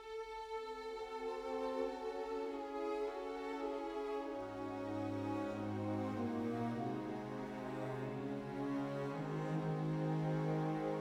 Piano Concerto No. 1 in E Minor, Op. 11, Romance Larghetto